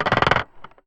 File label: Corrigated Iron, contact mic, Marbles; musicradar; contact mic madness; Raw